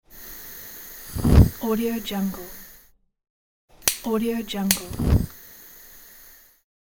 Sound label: gas cooker ignition; audio therapy